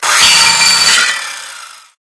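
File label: Movement Saw Ground; smite; god bakasura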